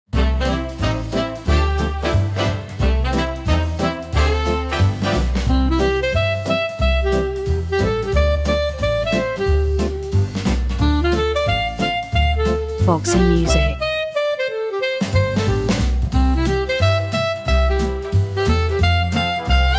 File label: Cartoon City; Foximusic